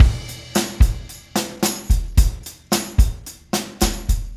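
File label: beat tam, Room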